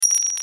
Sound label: coin; shaoxiadiablo